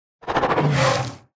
Spider Grunt General Scream Ending Combined; Deep Rock Galactic Boardgame

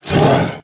Spider Grunt General Scream Start Combined; Deep Rock Galactic Boardgame

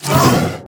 Spider Grunt General Scream Start Combined; Deep Rock Galactic Boardgame

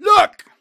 Laserpoint Set Marker; Deep Rock Galactic Boardgame; Dwarf

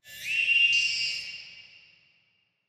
Grabber Scream Distance; Deep Rock Galactic Boardgame

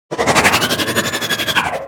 Spider Spitter Scream; Deep Rock Galactic Boardgame

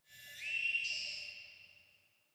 Grabber Scream Distance; Deep Rock Galactic Boardgame

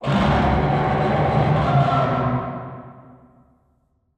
Shield Tank Scream Distance; Deep Rock Galactic Boardgame

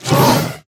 Spider Grunt General Scream Start Combined; Deep Rock Galactic Boardgame

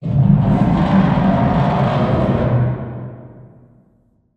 Shield Tank Scream Distance; Deep Rock Galactic Boardgame

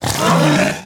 Spider Spitter Scream Start Combined; Deep Rock Galactic Boardgame

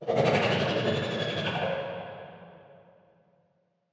Spider Spitter Scream Distance; Deep Rock Galactic Boardgame

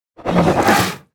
Spider Grunt General Scream Ending Combined; Deep Rock Galactic Boardgame

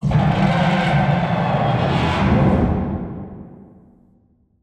Shield Tank Scream Distance; Deep Rock Galactic Boardgame

